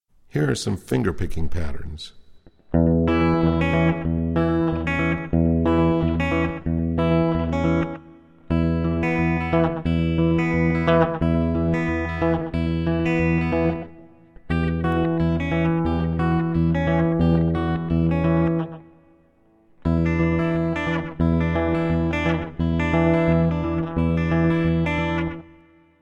rockabilly guitar

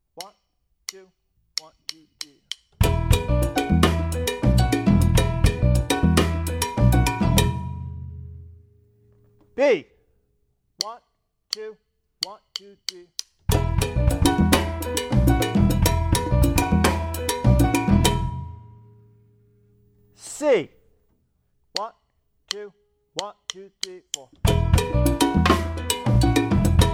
Mozambique Rhythms

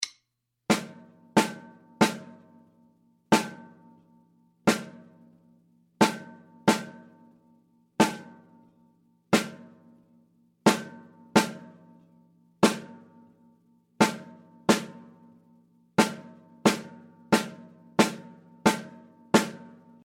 Snare Exercise

